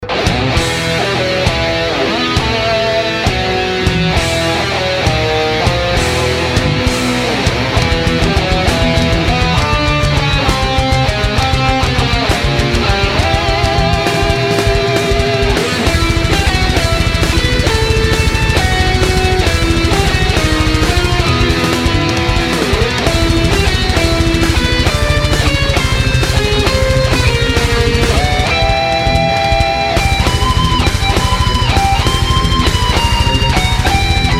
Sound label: Metal Rington